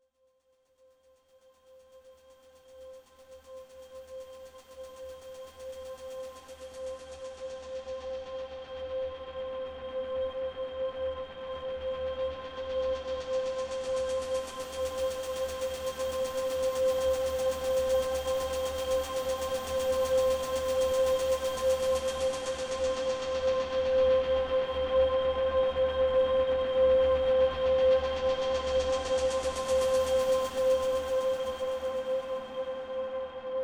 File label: atmosphere